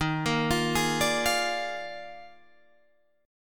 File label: Eb Major 7th Suspended 2nd Suspended 4th Eb Guitar Drop D; EbM7sus2sus4; arpeggiated